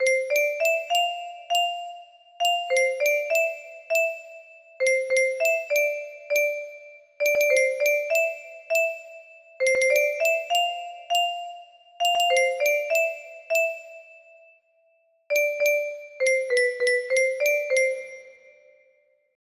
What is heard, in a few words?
music box